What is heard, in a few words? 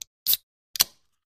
Soda Can Open; eating